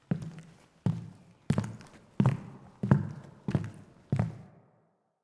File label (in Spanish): SFX PASOS; UBALDINO